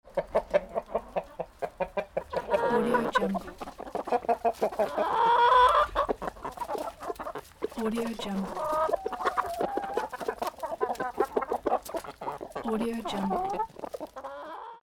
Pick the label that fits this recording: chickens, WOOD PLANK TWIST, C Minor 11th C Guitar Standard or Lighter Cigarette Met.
chickens